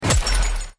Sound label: Ice shoot; Blockman Go; Android